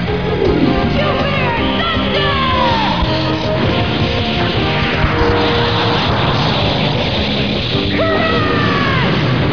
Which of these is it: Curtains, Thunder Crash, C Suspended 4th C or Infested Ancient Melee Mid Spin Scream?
Thunder Crash